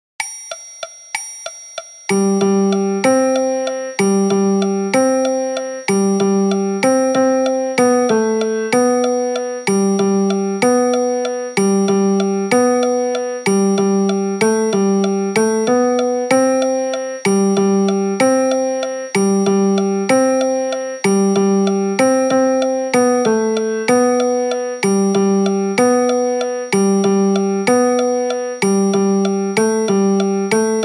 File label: Piano Version; Tavallod; تولد